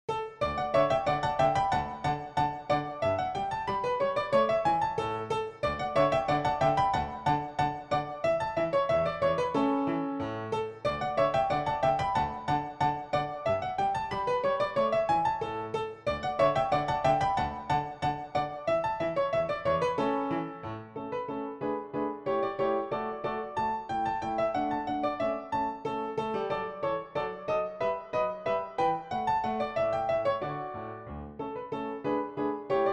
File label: Dance PianoTeq Bechstein